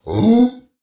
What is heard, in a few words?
Monster